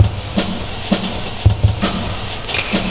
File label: Adrift Break, Jungle Breaks; Blu Mar Ten - Jungle Jungle - 1989 to 1999 Samplepack